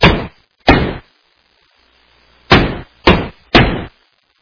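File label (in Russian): настойчивый угрожающий стук в дверь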